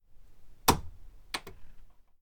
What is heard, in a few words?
door naproom close quiet after; anemoi